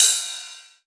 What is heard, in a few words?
Rides, Cymbals